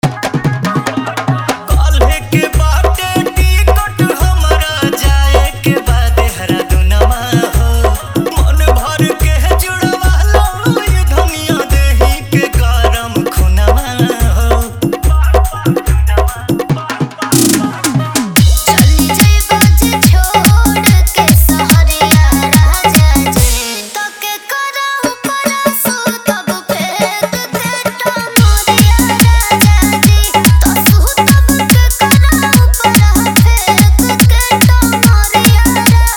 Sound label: Hard Dhollki Bass Mix